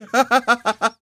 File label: LAUGHS; Spectrasonics; Vocal Planet; Jazz FX